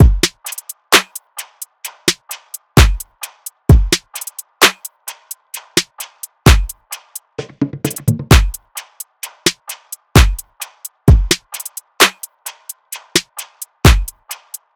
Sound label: drums 130, Full Drum Loops; Slate; SEISMIC Drum Sample Pack